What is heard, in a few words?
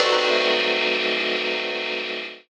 RAW RIDE; Rawcutz; Nu Forms